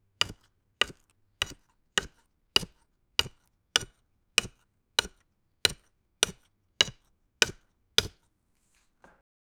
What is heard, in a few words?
quickly chopping onions w; food preparation